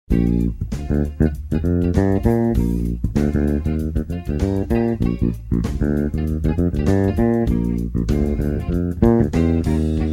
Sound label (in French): basse